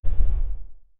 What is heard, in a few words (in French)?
un effet sonore de whoosh; non-aggressive